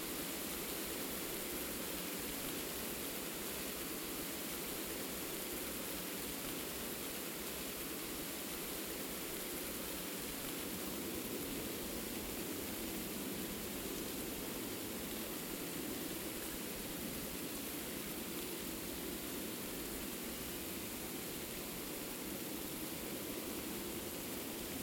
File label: Ambient Rain Inside; Five Nights at Ronald's